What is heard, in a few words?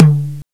FLOOR TOM, DRUMS; FAIRLIGHT CMI IIX